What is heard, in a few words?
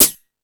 Hip House, drum machine